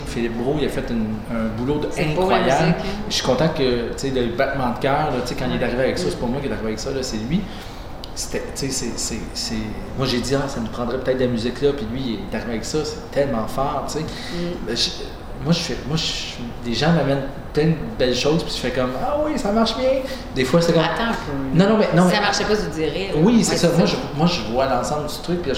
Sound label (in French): musique